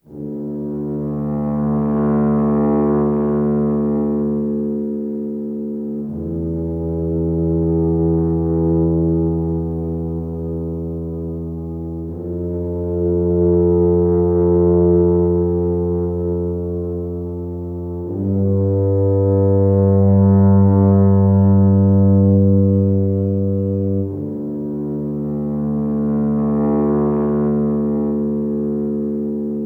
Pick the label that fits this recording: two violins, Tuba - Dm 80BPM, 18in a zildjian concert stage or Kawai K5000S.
Tuba - Dm 80BPM